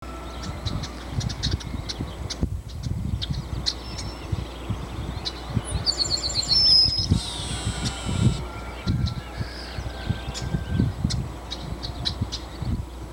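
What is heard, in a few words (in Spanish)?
varillero congo, aves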